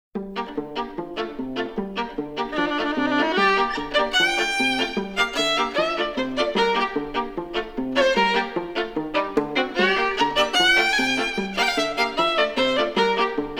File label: Violin Version; octopath traveler ii